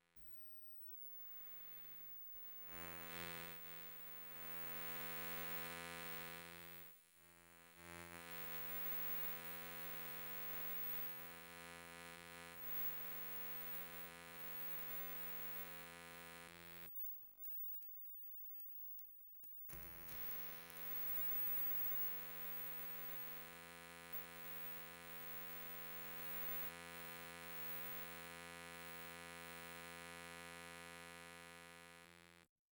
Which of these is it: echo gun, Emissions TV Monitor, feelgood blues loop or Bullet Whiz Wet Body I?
Emissions TV Monitor